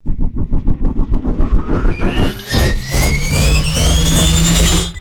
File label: futuristic synth blade spinning up